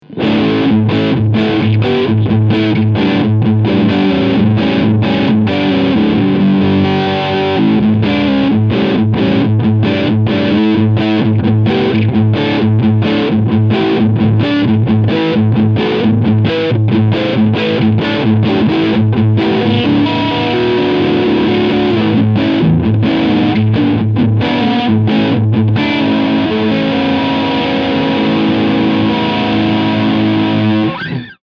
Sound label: G&L Tribute Asat Classic Blues CAR Overdrive beide PUs